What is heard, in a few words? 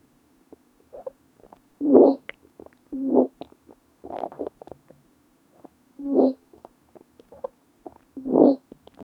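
frequent soft feminine gul, human sounds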